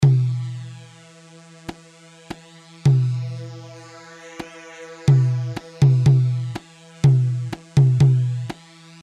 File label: Tabol; Tabol B طبل; جميع الضربات تم تسجيلها كما هي، كما أنه لم يتم إضافة أي معالجة